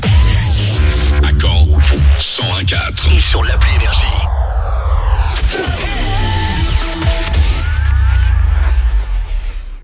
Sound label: JINGLE